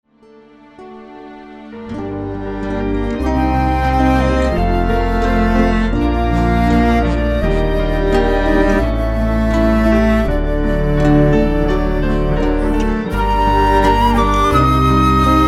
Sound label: instrumental; Here God Lives Among His People